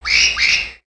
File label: Bird Amazon Oneshots; ambience; gnarled forest